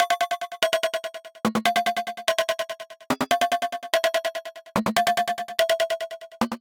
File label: Strike Slip A Minor 145 bpm, Percussion & FX; MIDI Clips; Tonal; Skitter and Step; Ableton Factory Packs